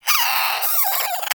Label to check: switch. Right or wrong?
Wrong